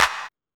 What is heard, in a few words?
Techno A; EMU XL-7